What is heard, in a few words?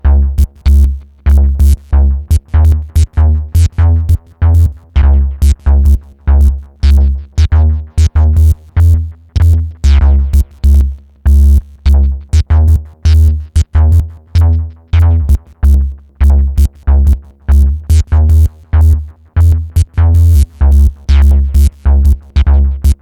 Formant Patch